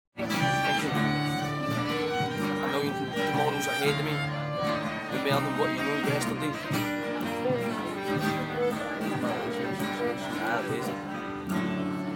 folk rap; knockengorroch

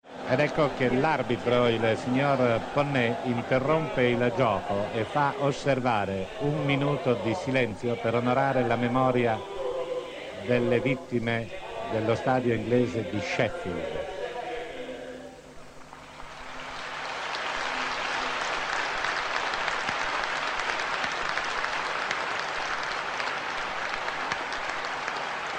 one minute silence, sing; ac milan; liverpool; 4 days after hillsborough

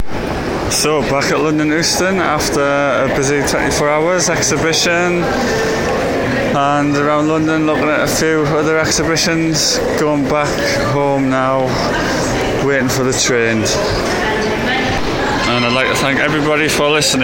euston train station

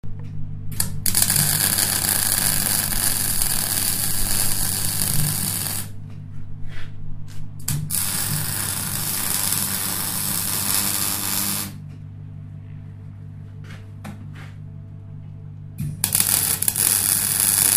welding steel in his workshop; sculptor guy thomas; stowford manor farm

